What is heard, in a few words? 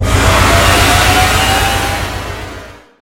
Boost; Rally Champ